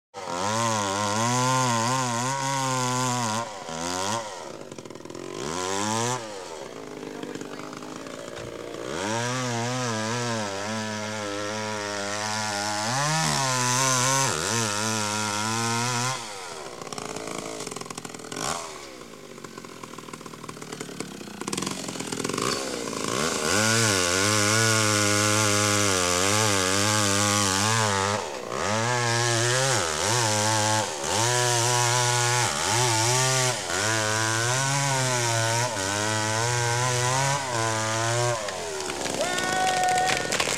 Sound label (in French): tronçonneuse